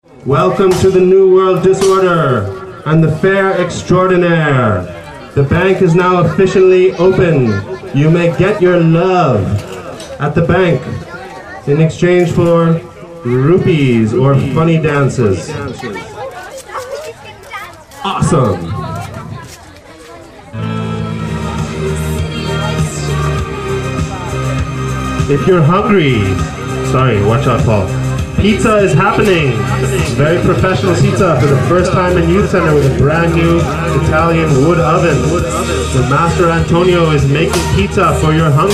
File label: soundscape youth centre fair afternoon; english